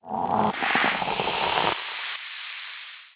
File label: alien hiss; prayingmantis